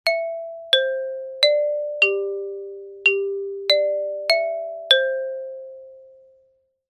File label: PERCUSSION XYLOPHONE